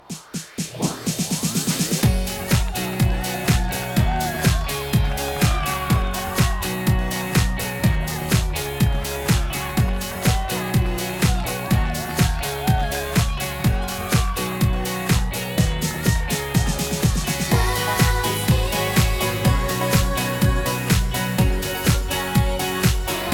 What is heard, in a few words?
(instrumental); My Little Pony OST Repository; Season 2; Bonus Music